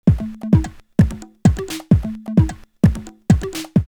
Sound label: Star Break 130, drumloops Rave; X-Static Goldmine; 90 s Sample CDs